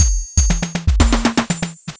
shuffle fill, 120

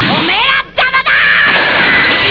Error

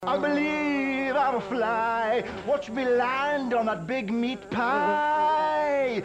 fly

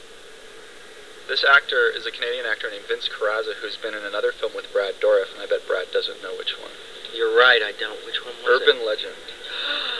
gaaasp

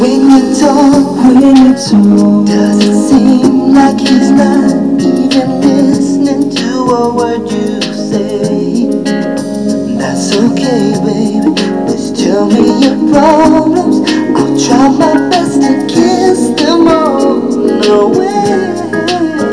Lead

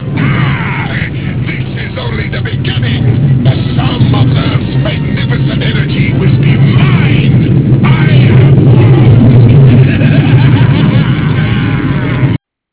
energy

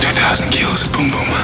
boom

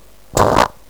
fart

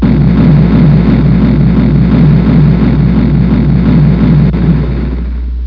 Boom; Large explosion; Raiden

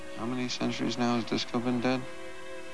disco

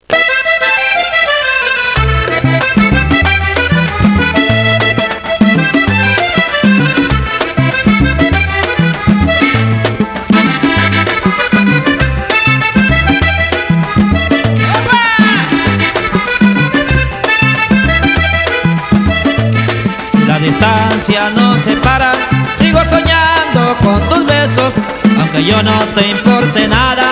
solo

